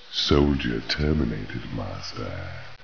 DIE; Virus

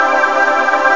choir

